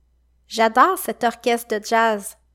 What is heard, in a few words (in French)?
Orchestre